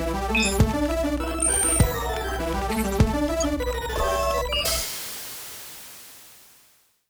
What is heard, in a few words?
Jingle